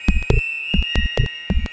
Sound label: amb 138 a#, Techno, atmos; Techno ID; atmo+fx-loops